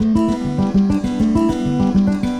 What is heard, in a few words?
Guitar And Synth